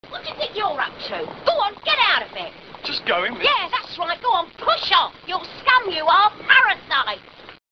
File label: push off